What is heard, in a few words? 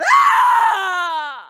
k o scream; mk9 sindel